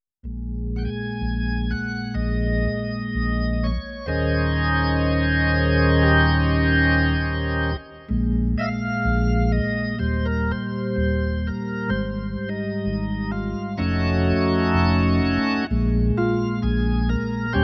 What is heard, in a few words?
ballad